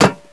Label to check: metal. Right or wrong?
Right